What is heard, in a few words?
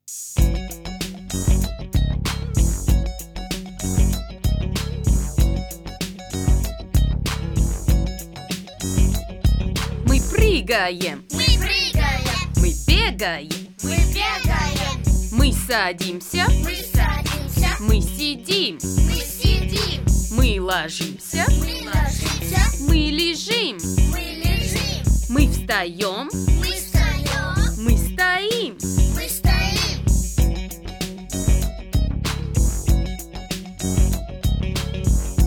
Rap